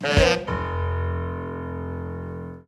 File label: Band - Hit trouble; Ed Edd n Eddy; Adromulus Pack